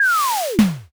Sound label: something falls